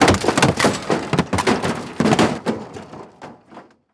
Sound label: metal crash, metal objects drop